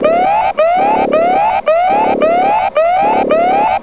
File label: Alert